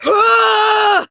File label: Exertion, Adult; Warframe; Adult Operator; Dialog Barks